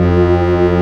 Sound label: Organ, Barton Bold&Bras